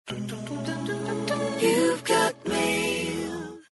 Message Tone; LG CX231

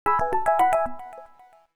A toy watch; Samsung GT-S5330 Wave 533

